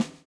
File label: swing